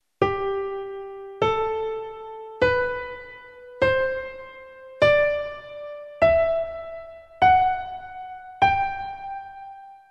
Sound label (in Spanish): escuchar sol mayor agudas